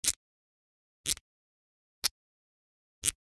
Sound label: Generic Click Plastic Small